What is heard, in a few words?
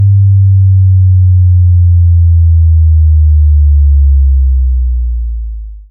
slowmo